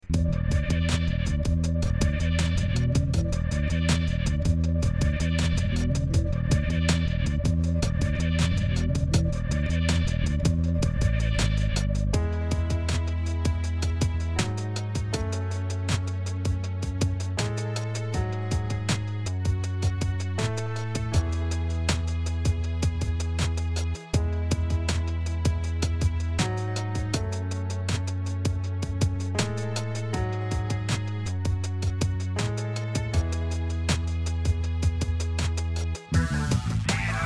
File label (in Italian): Elettronica